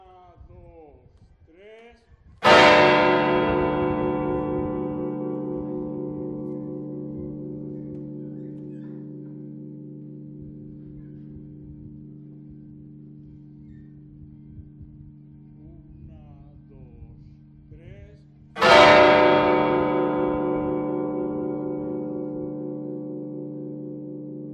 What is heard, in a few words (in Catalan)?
campana